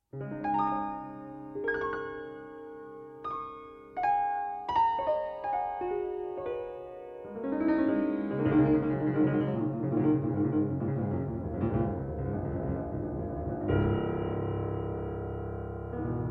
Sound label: piano sketches